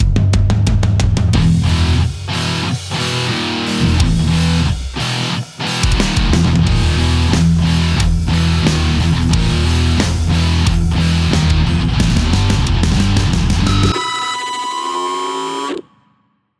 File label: Heavy Rock